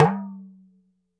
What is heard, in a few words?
Talking Drum hi up; E-MU Producer Series Vol. 7 – Old World Instruments